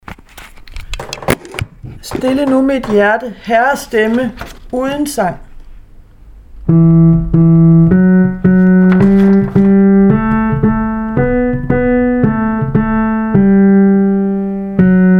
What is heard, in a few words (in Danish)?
herrer uden sang